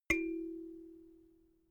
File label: notification kalimba second